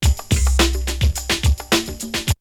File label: Drumloop; ZERO G - Datafile DISC 3